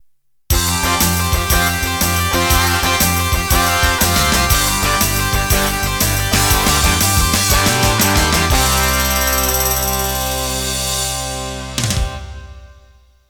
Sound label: Shuffle End